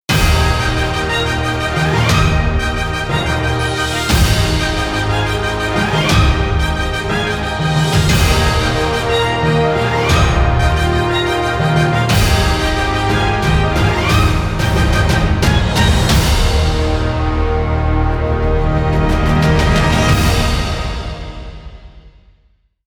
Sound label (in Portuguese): impacto